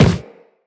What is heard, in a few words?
Run Wood, Footsteps; Characters; Operator